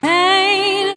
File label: "hey", SUNG